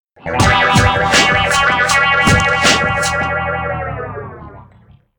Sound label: Failed; Touge Max 2